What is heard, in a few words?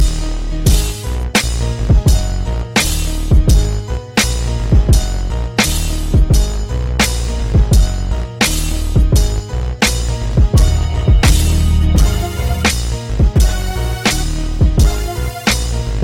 hip hop beat